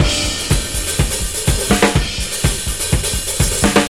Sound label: 124 bpm breakbeat sample g key, drum loop